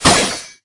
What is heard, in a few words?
prince hit